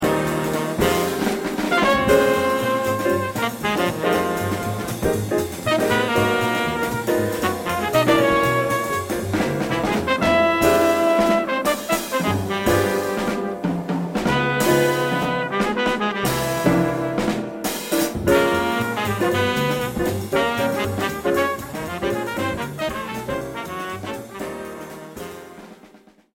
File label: drum